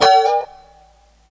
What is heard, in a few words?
A FUNK CHORDS, Guitars; Spectrasonics; Hans Zimmer Guitars VOL-1